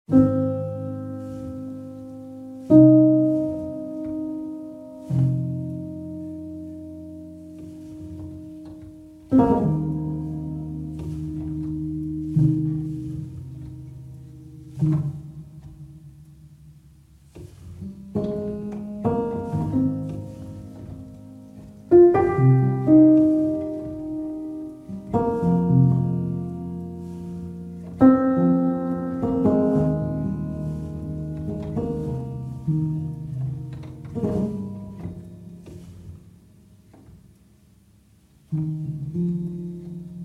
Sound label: Solo Piano